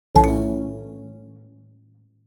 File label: Ball, Nokia Alerts; Windows Phone apps